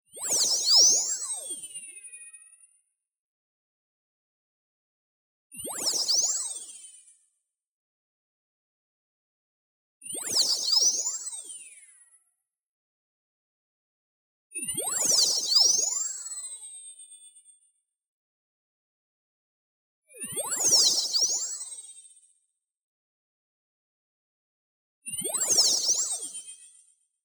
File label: tonal, neutral sine shift dual delay; boom library; Anime Essentials